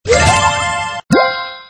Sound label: Box Open